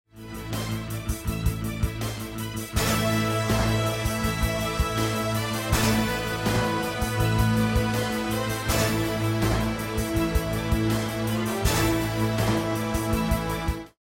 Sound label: won round; music kits custom